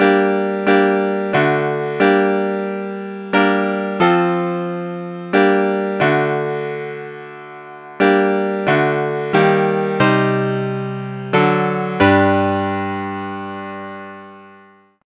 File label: piano; nyquist